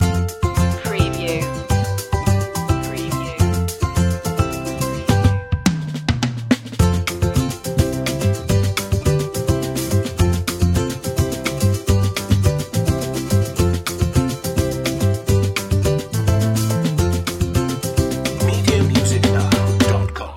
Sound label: easy life loop